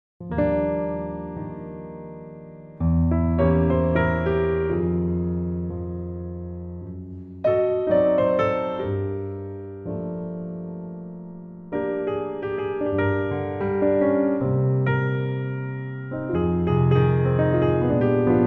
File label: Smooth Lounge Piano, Crystal Flutes